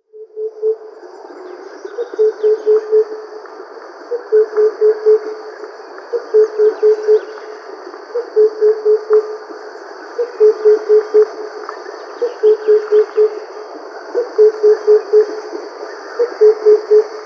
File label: Oriental Cuckoo male display